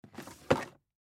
Drawer Open Plastic Filing Cabinet; Storage Bin; Office; Blastwave

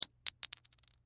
Bullet Shell; Bullet Shell 45 Drop Cement; Drops (Sound Effects:Objects); Blastwave; Warfare

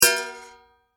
Household Pot Lid Lift Open; Kitchen

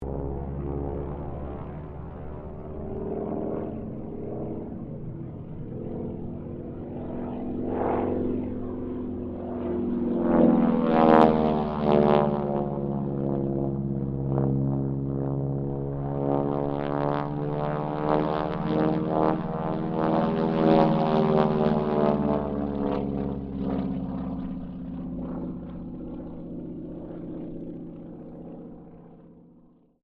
AIRCRAFT AT-6D HARVARD MK III LOOP; Temora Harvard MK III; Airplanes; Vehicles; Airplane Sound Effects

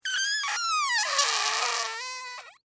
Squeak Air Fart; Texture; Squeaks; Sound Effects:Objects; BlastWave

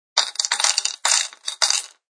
Tossing multiple coins into a bowl; Clinks; Objects

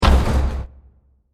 Bursting Metal; Impacts; Bursts (Sound Effects:Impacts); CraftedSignals

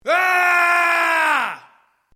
MALE ROAR AGGRESSIVE SHORT

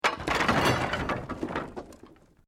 Wood Collapse

